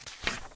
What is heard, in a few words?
page flip; OneShot Fading Memory